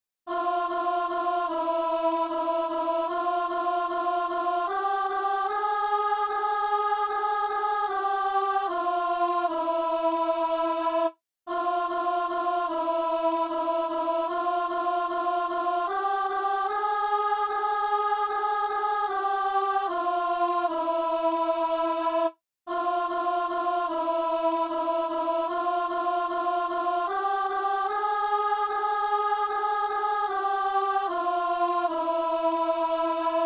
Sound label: alto; Messe de l'Ermitage - Agnus